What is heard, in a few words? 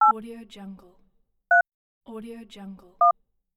phone dialing number sound